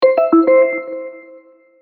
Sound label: alert